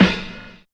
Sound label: bassy steel snare drum sound f sharp key